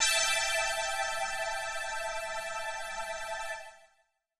125 pad E, Keys, Nu Jazz House

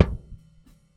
Kick Ride Bed; Airwindows

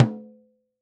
Rack 30cm, Drums; Polyend; Simulacrum Sounds; 1 Shots; Acoustic and Electric

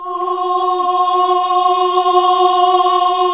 OHH F#, Nuns Ooohh; NUNS AAH OOH; Sound & Vision - Gigapack II CD 1

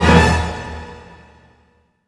hit, orchestral; Orchestral Loops

